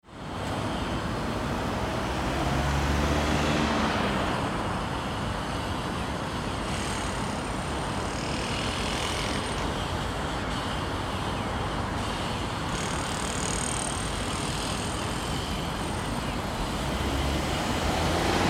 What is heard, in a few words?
traffic city